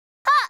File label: Turncoat Psyper attack